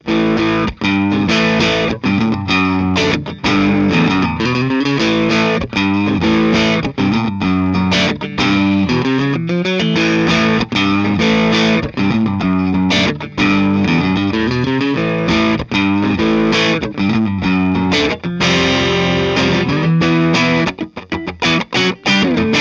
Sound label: Bridge Neck Light Dirt, pickups